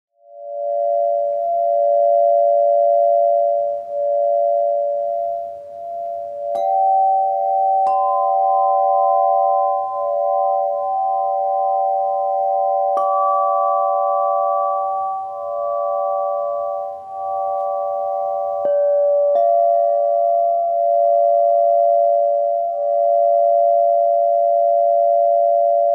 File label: Celtic Moon D, harpe de cristal; harpe de cristal celtic moon re 8 tubes